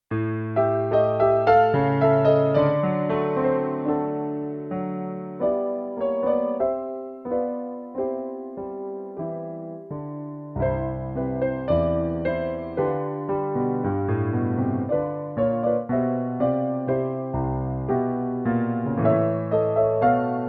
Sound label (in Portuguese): playback hino